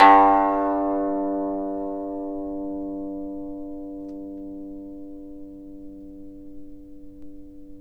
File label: YANGCHIN, G1; INIS - Opium